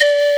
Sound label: STEAM; Greytsounds; HARP+STEAMER